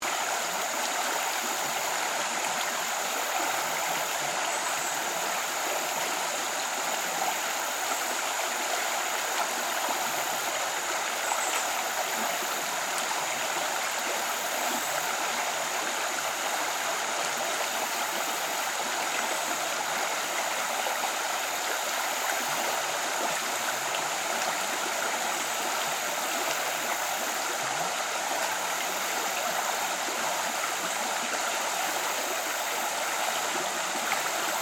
Creek Forest, Ambience, Creek, Forest, Insects, Birds, Loop; Rivers; BlastWave